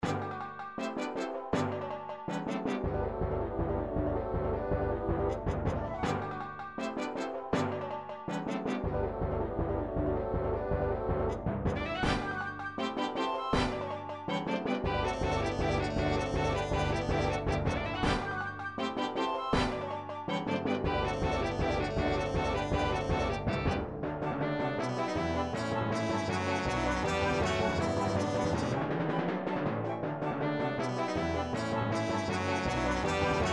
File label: trepak, classical midi